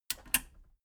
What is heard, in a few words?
metal button radio click power off slow